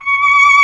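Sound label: Chamberlain voice; AKAI S6000 CD-ROM - Volume 1; SYNTHESIZER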